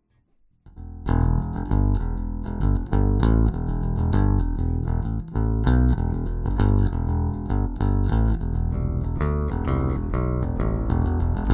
bassline original

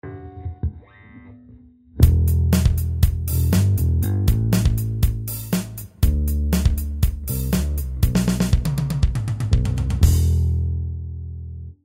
bass guitar no sustain full

